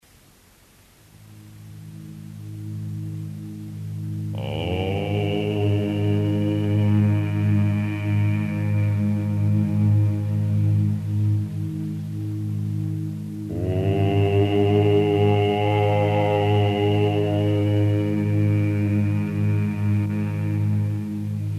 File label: mantra gayatri; av yoga; mantry